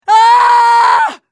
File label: scream